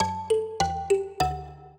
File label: minuet, mbira; Mozart